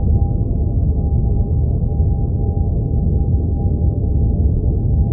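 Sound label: loop background noise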